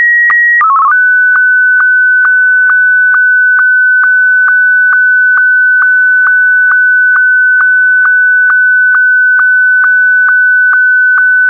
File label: Sstv ascii mars